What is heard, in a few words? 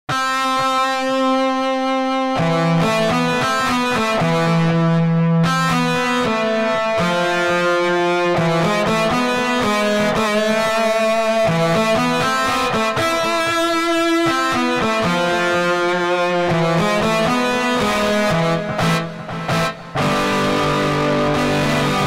Guitar Distr